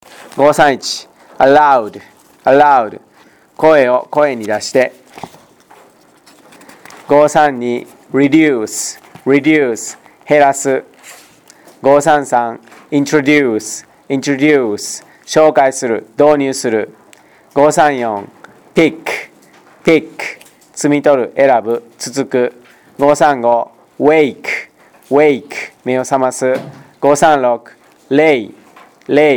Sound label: tango